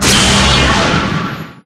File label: Battle; Life of a Naomi